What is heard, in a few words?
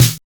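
LINN SNARE LO; Drums In Your FACE!; NEWJACK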